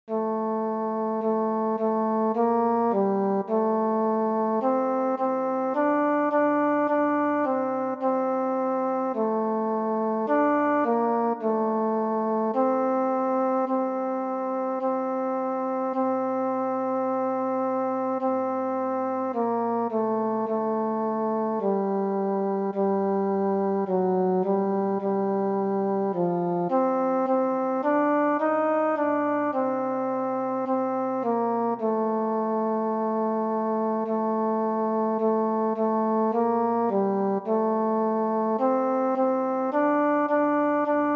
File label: tenor